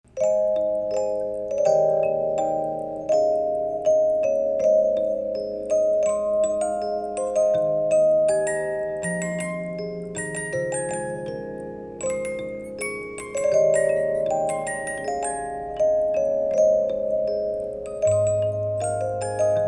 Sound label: 50 NOTE Sankyo, musicbox